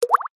cartoon drip stereo

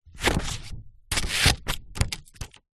office scissors small blunt cut paper sheet; zapsplat

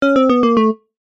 game sound retro lose tone

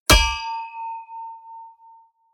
Impacts processed; Just Impacts; soundbits

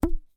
hair elastic band twang

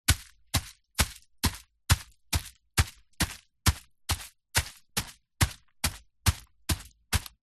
foley footsteps heavy staw leafy ground

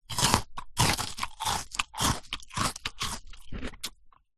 human eat crunch chocolate biscuit; tim tam

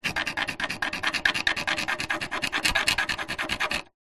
foley wood scrapes sharp metal object; zapsplat

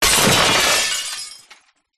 impact crash metal and glass hard

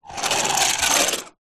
leisure toy skateboard tiny roll floor

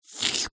cartoon lick

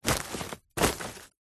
industrial tarpauling bag step on tread foot

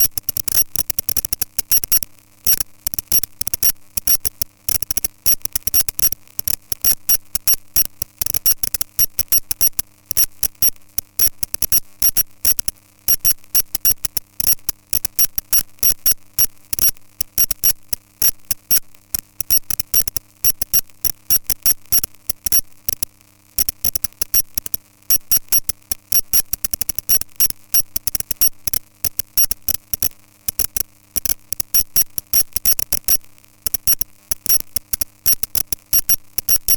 Signal Interference

